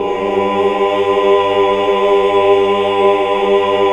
AAH, AAH OCT; Best Service; Hallelujah

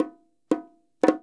LOOPS, NYC Percussionworks; Sampleheads; ER LOOPS 84

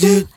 A Doot G2, Lounge Hitz

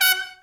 SECT BRASS FX

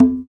Congas, African Contemporary

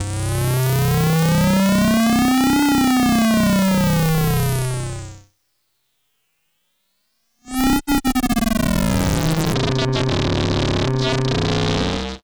analog, special fx twisted; USB Soundscan; AKAI

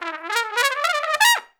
Trumpet Noise; Sonic Foundry (Sony Creative Software); Jazz Solos and Sections

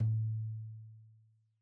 Tom2 Stereo, Deeply Sampled Drums

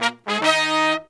Pop & Funk Brass

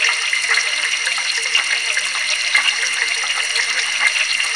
DRIPPINGS; Zero-G Ambient 1

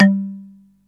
marimba G2; East West; Global Sounds

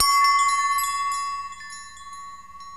TIBETAN BELLS; SUZUMI; Crypton - DrumBase5000

